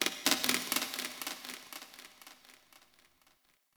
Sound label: Crp Ship Metal Knocks Texture Small, Corpus Ship Ambience